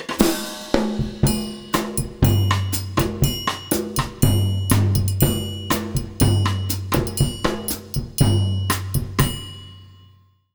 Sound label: 120, Brazil Bossa; USB Soundscan; AKAI